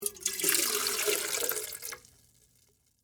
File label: Water Pour Pitcher, Metal; Pouring; Sound Effects:Objects; BlastWave